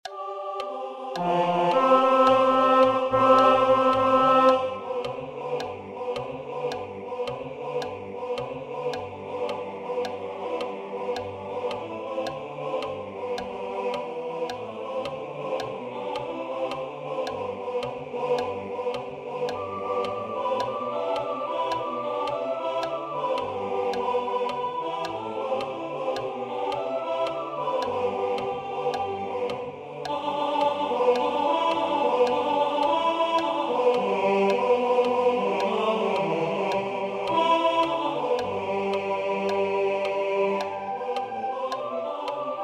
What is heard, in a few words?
Sourwood Tenor; Sourwood Mountain